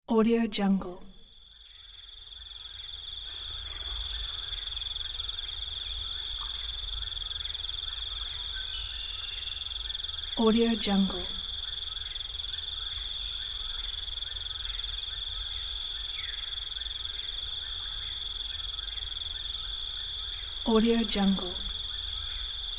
evening forest

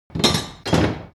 construction foley clank metal massive

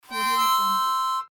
harmonica

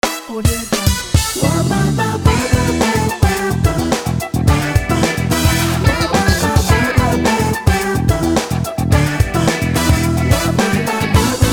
summer funky tv show ident

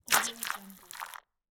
organic horror interface fleshy notification

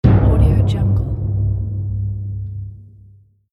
timpani hit drum

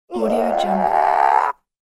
cartoon raspy creature anger

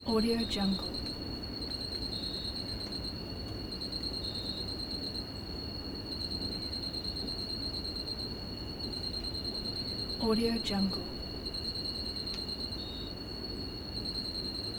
rainforest night

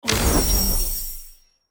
magic surprise

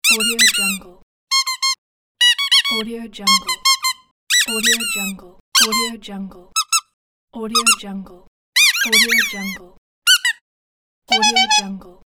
squeaky toys and horns

